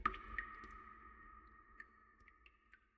trigger drip; Minetest; backrooms

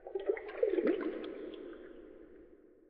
trigger water push; Minetest; backrooms

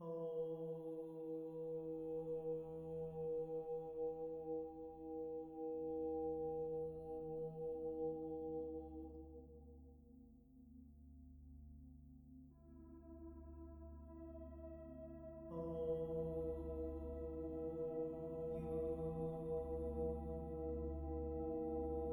Voice Ambient, music; pzserver

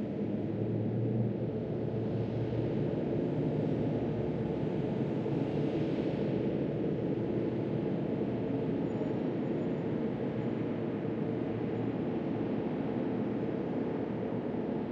traffic distant; Minetest; backrooms; klangfabrik

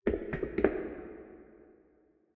trigger footsteps concrete; Minetest; backrooms

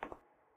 tile step; Minetest; backrooms

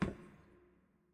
concrete step; Minetest; backrooms

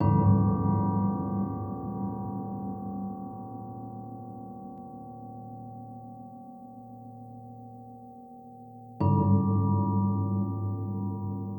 Percussive Ambient, music; pzserver

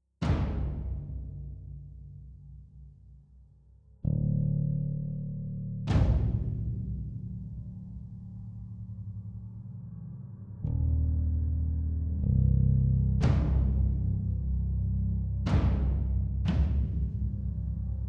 Intense Ambient, music; pzserver

